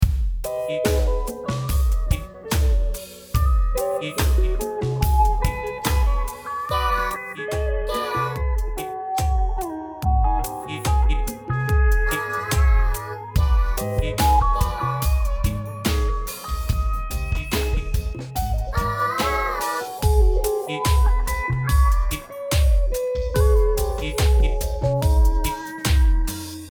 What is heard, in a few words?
slo funk cue